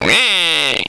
whirr

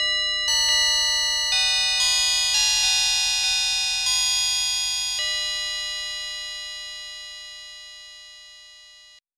winchime

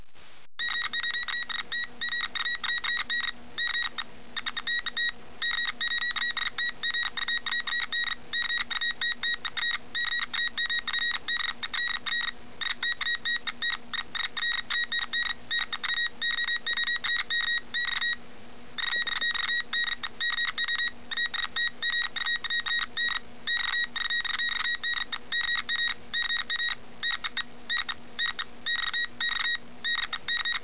MORSE CD

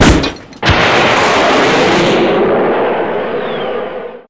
Eject; Falcon 40

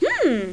hmm; Tetris Collection for Windows 98

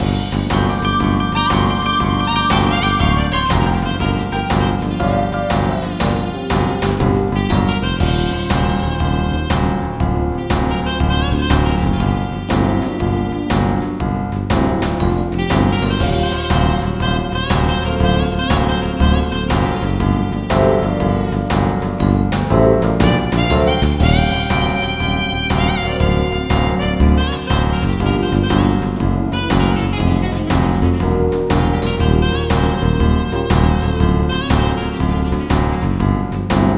jazz

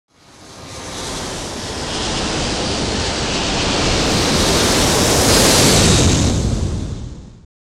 ENGINES

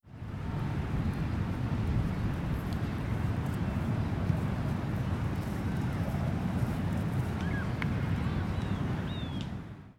loud; phoenix-end

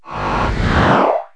Power Zap, Space Zaps; Cartoon; 16-bit; KABOOM! - Wild and Wacky Sound Software; 1,000 Sounds

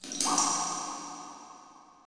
PIPES, pipes

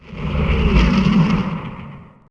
fly by

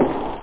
step; amigatextureenginedemo

